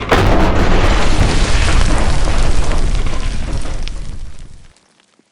Bridge Collapse; Lethal Company

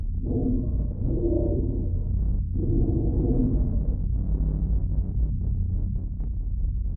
Burrowing Rumble; Lethal Company

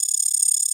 terminal load image

